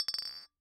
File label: Bottle Struck